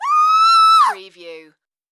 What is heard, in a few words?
Scream Female